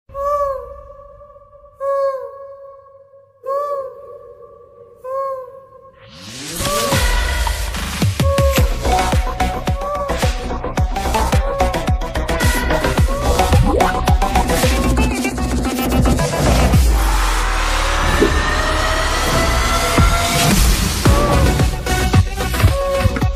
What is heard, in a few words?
trailer bgm; mathu vadalara 2